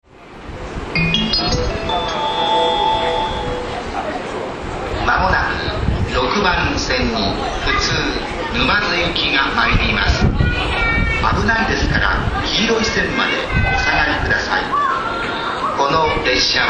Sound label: approach, rail sounds; Stereo; Tokaido; Yokohama